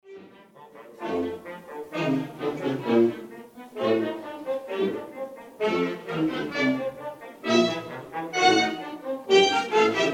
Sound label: high school band; conversion to digital